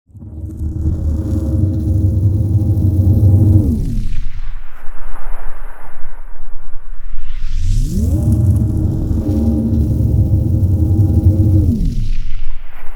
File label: Movement, Designed Plastic; Gregor Quendel